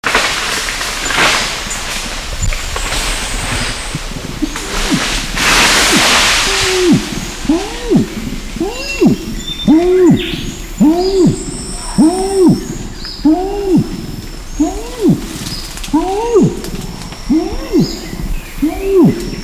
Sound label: Long Call